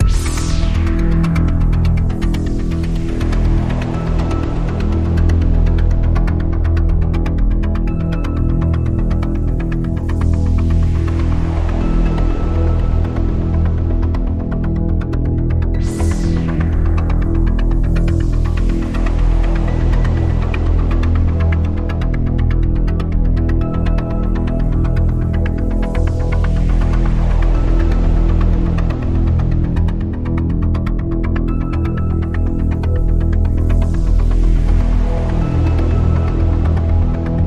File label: Radio Toolkit Pad